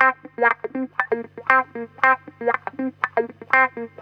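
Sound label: INDY guitar1, 119 bpm; USB Soundscan vol.32; Roots Disco; AKAI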